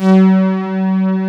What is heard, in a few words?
MINIMOOG SOL 1; USB Soundscan vol.49; Analog Fantasies; AKAI